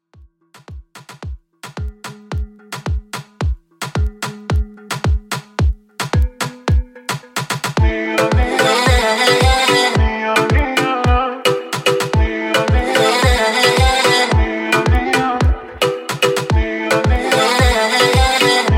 Pop Moombah 110 Bpm

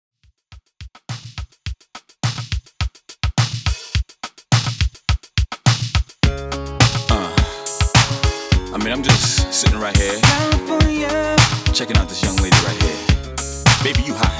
Old School Redrum 105Bpm

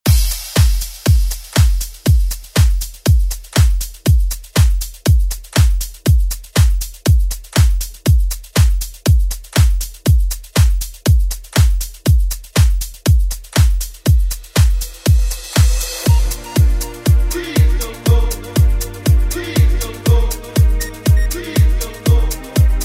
90s ReDrum; DJ Mhark

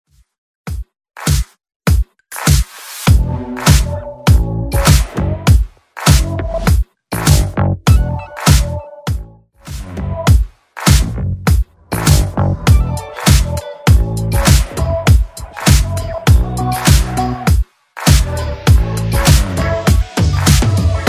Top40 Redrum

